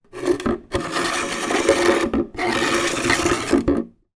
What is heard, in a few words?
household watering can plastic empty small drag on hard floor x2